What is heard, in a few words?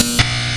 button